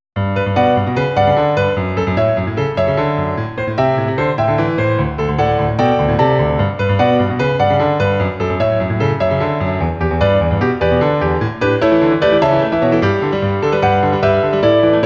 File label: piano; Kirbys Block Ball